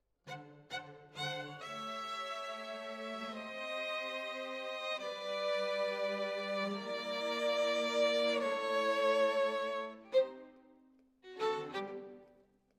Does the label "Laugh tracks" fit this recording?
No